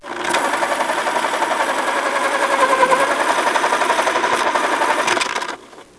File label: Electric sharpener, office